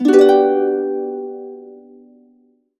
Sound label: harp chords, G maj7; USB Soundscan vol.62; Complete Harps; AKAI